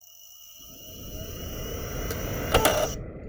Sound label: Spin Up, DVD Drive Internal